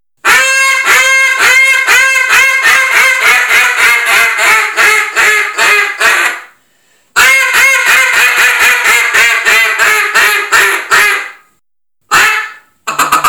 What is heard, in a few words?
Double reed